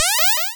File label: Cry